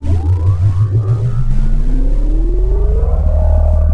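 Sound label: forcefield start; Star Wars Obi-Wan; XBOX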